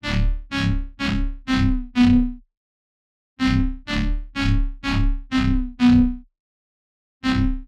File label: 125, D, Bass Loop